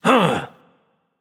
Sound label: loud sound; smite; voicepack; osiris